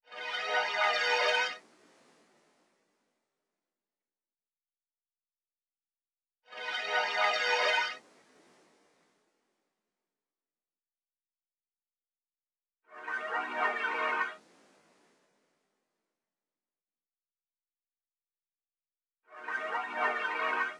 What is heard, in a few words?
150 BPM G# Min Reverse; Cymatics; 2022 Melody Collection; Wavy; Nebula